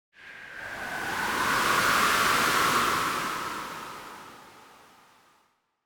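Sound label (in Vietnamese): Tiếng gió đáng sợ ngắn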